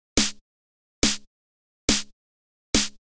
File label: snare